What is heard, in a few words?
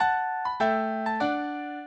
minuet, mozart